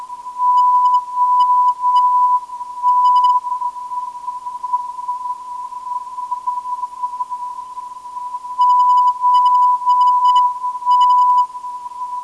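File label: 329kHz, non-directional beacon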